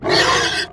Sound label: Raptor Death; Playable Tutorial Level; TR3